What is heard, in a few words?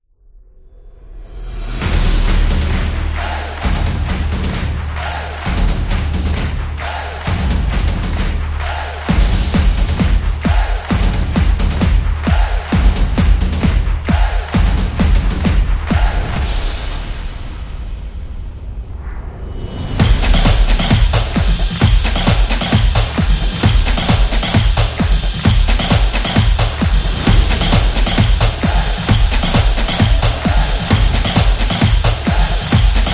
Football Anthem